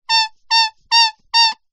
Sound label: leisure toy rubber duck squeeze squeak